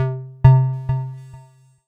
C1, ANALOG SEQ; Roland JV 2080

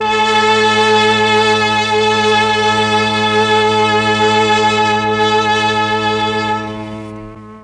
MEL STR, Legendary M400

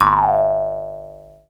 JEWS HARP; Jew's Harp & Spoons

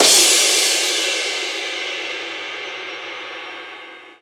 Crash, Cymbals

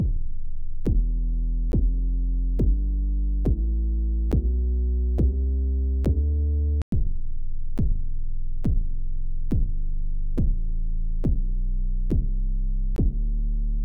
Yamaha Tenori-On Knockkit

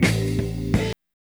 Kord Break 081 A, Lounge Lo Fi Break Fillz

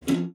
PLOK D#2, spinet; Xsample VOL-7

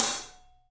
Studio Hats, 3DPercussives

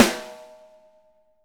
6X12 ALUMNUM, Living Drums

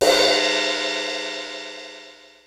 HATS CYMBALS; Kid Nepro; Elite

